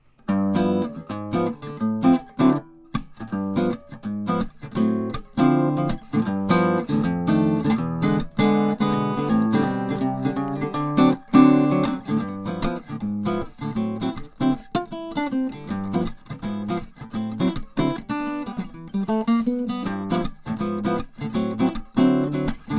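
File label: Martin CF-1 acoustic guitar; natural blonde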